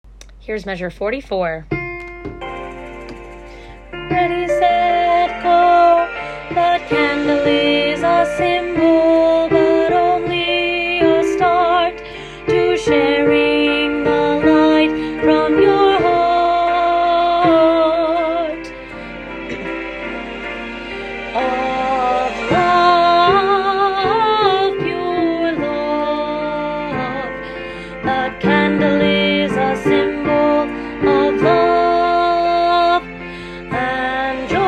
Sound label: alto